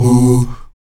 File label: VOX AHOO, Afro Chants, Stacc Ah Oos